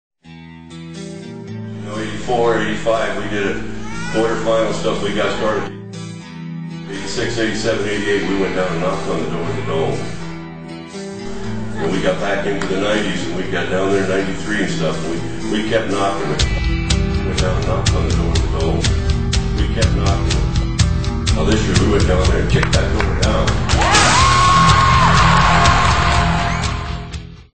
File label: knocking intro